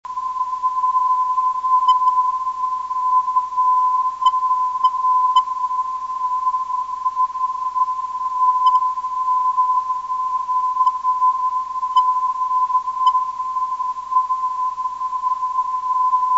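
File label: 330 kHz, non-directional beacon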